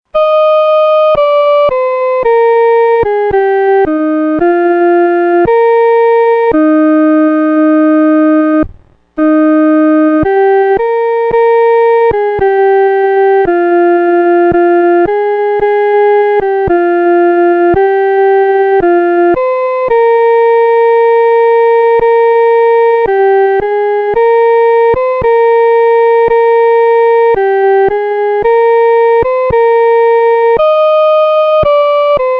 独奏 第一声

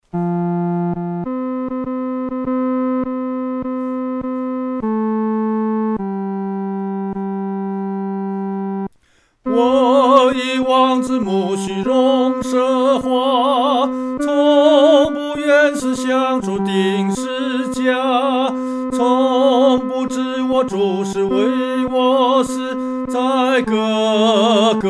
独唱 第三声; 在各各他

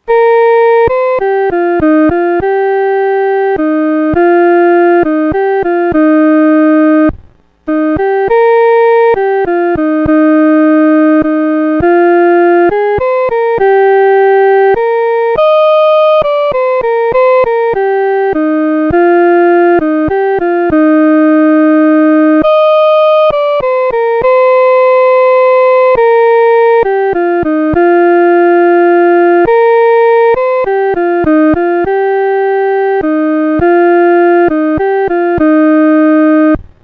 独奏 第一声; 主，我来就袮

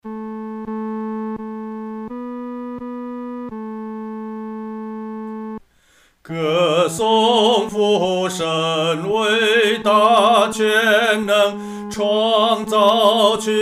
独唱 第三声

